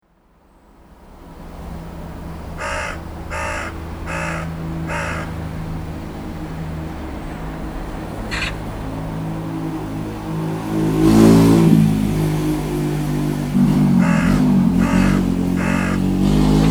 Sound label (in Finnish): varis ja harakka; linnut